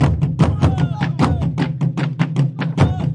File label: burundi beat, drum loops and breaks; 141 to 160 bpm